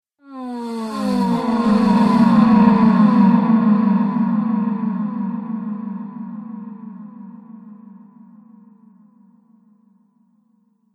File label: Female Space Orgasm, sci fi music